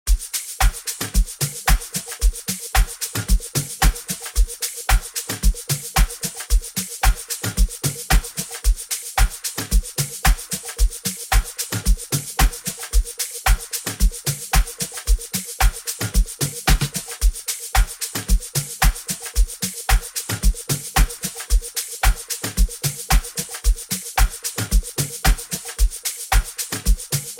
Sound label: Sax